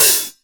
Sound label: Real Closed Hats